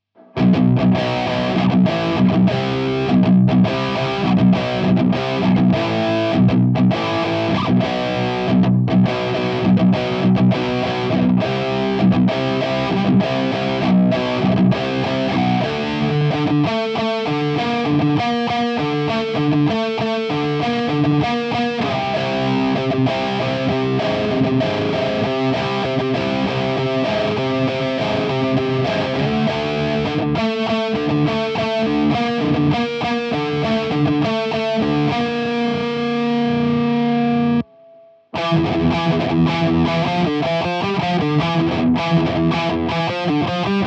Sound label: drop b pickup